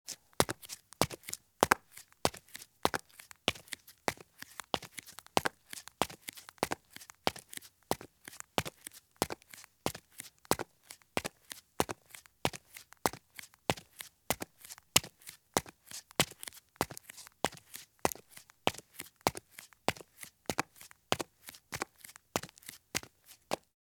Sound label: Flip Flops Walk at Fast Pace on Concrete; Sound Ideas; Footstep Sound Effects